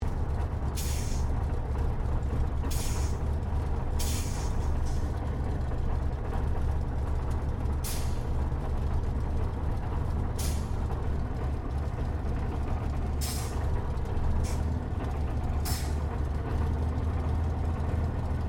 AIRCRAFT SUPERMARINE SPITFIRE MK-XVI TAXI SHUTDOWN INTERIOR; Airplanes; Airplane Sound Effects